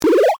Powerup Health

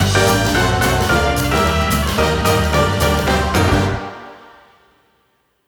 Win

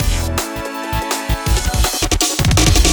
Transition; sky rogue

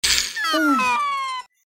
Open fail; Troll Face Quest Unlucky; Android

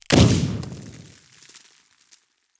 explosion; teardown; ps5 windows xbox series xs

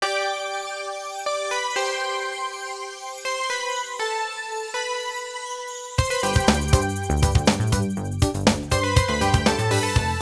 Victory; Chex Quest HD

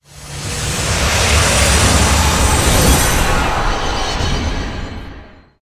sys gameover; Shin Megami Tensei Devil Survivor 2 Record Breaker; 3DS

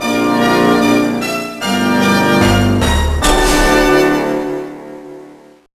Unused Jingle; X-Men: The Official Game; GBA

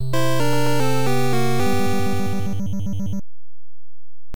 Round Start; Dragon Buster; NES

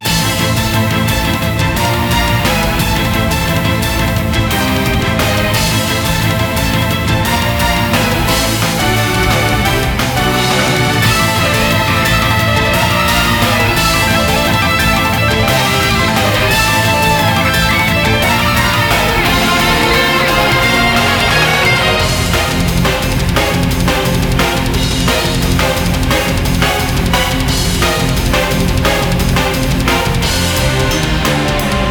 RPG Battle Metal Mix Intro; Granblue Fantasy Versus

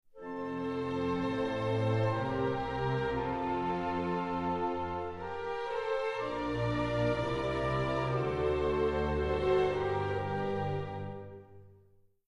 lose jingle; Austria

